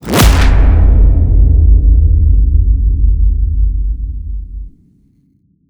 impact data; Virtual Riot; Impacts

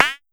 Pollard Perc, Syndrum

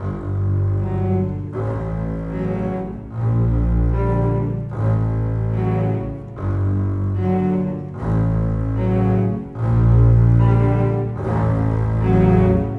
Bass Cello, Cinematic; Sonic Foundry (Sony Creative Software); Orchestral Series VOL-3 - Cinematic

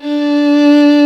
VIOLINS D3, LEGATO VLNS; Instruments & Percussions; InVision Interactive; Lightware VOL-1

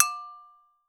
BRAZILIAN, Percussion; Voice Crystal - Steve Reid Definitive Percussion; BRAZIL SET

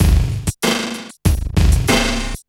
CB LOOP; Zero-G; Chemical Beats

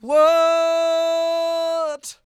CROON, Vocal Blues & Soul MELLOW

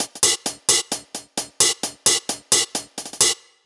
131 Live Breaks Hats, Drum Loops; Zero-G; Soundclash Nu Dance Electro; Acidized Wavs; 131bpm

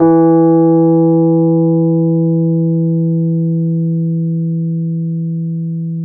E, FEND KEYBASS; Sonic Reality; Vintage Timetraveler

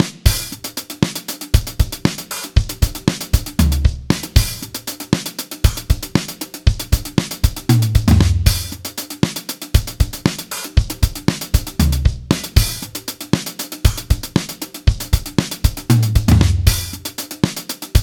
bpm117 funk

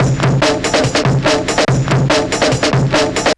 drums, 142 Dmin, Hardcore Breakbeat; Big Fish Audio; Hardcore Breakbeat Action; Shake That Booty

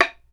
BAMBOO, World Assortment

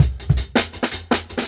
Jungle Breaks

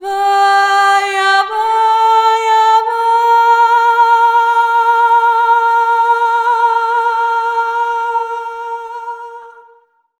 VOX, Balkanish Traditions; USB Soundscan vol.63; AKAI

